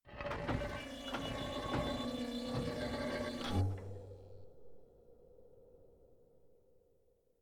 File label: Spaceship door